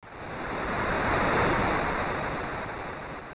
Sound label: Shore; Water at the shores